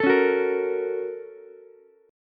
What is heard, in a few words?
Bb Minor Major 7th Sharp 5th Bb down Ukulele; BbmM7#5; strummed; JGuitar